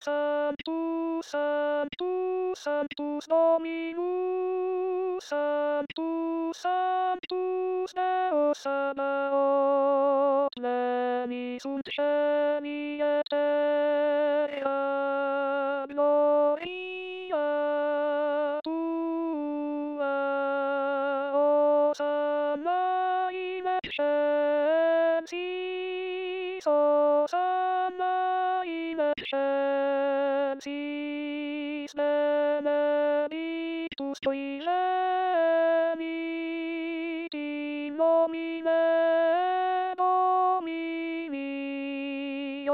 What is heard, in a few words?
sanctus alto; missa pro europa